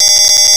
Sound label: alert, respawn turret